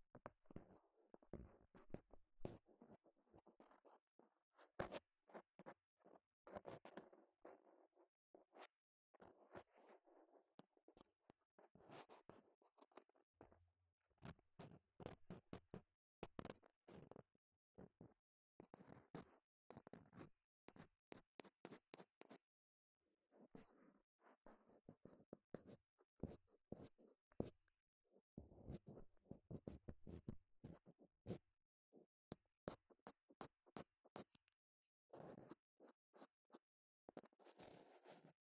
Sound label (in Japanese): 竹耳かき 外 L; 3DIO; 耳かきに関する音